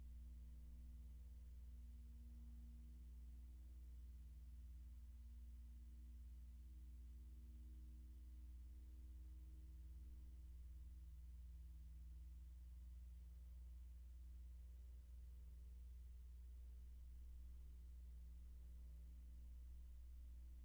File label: roomtone